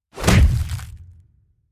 Super power punch

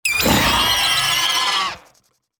Pterodactyl dinosaur roar call

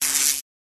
Electric voltage sparks

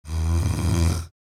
Comic sleep snore; shorts sound effects

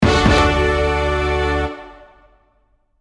Ta da reveal; film and tv

